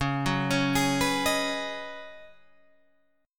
C sharp 9th Suspended 4th C sharp down Guitar Open G, chord